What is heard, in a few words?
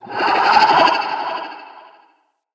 Corrupted Slug Vocal Attack, Creatures